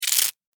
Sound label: Insect, Movement, Fast, Scurry, Bug; Sound Effects:Animals:Insects; Blastwave